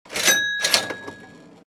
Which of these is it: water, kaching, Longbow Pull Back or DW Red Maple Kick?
kaching